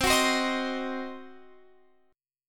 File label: C Diminished, Guitar Open F, chord; strummed; JGuitar